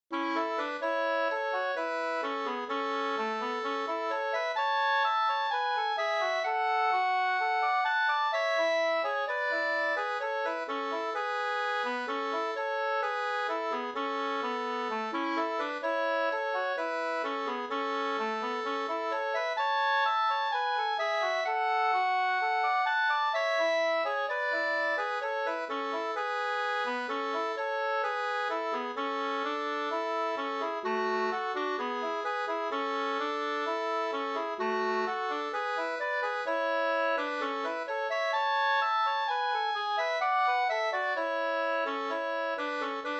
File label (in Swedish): brudmarsch 4-4